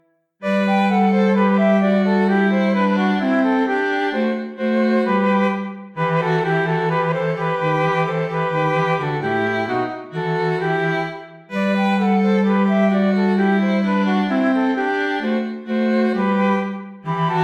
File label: La suavecita Quartet